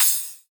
808 & 909 Kit CRASH; Roland Juno-D

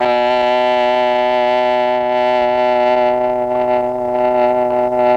OBOE; MEL.OBOE

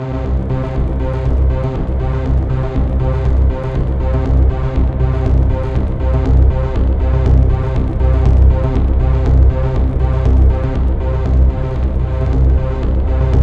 C2, SynthLine; 90 s Sample CDs; NiceBeats; SynthLine VOL-7

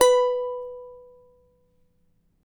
charango B3, guitars; Spectrasonics; Hans Zimmer Guitars VOL-2

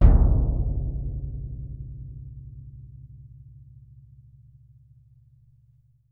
BD2, Percussion; Miroslav Vitous - Percussion & Harp

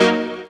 Dirty Piano; Big Fish Audio; OSH Chords

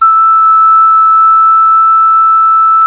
Clay Flute

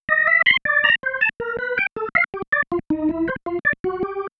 Single Riff, Organ

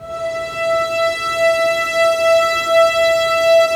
STR SEC; Roland - LA Composer vol. 1

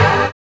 SYN BEAT; DANCE; Sound & Vision - Gigapack II CD 1

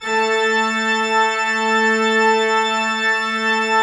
Cathedral Organ; Propeller Island

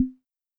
Conga Hi, Yamaha EL-605 drums

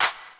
Handclaps; Giga Samples Collection; ELQ-DrumQit

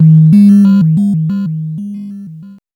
ARP2600; AMG - Vince Clarke Lucky Bastard

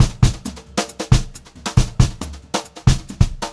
Brushed Drum Loops; USB Soundscan vol.39; AKAI; HOTROD

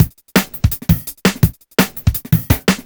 168, Drum Loops; FireBrigade; M8; Electronisounds

